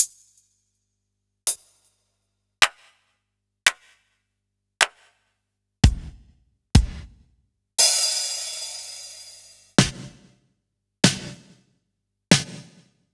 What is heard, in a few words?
Electro, Kit; Sliced